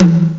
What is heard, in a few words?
tom tom achi; Amiga Special - Software Hits; orchestra; drum